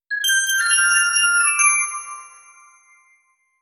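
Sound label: a sound effect to congratulate, congratulations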